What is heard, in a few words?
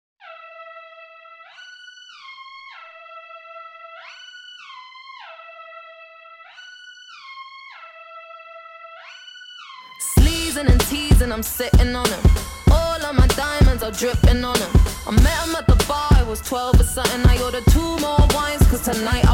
Commercial Jazz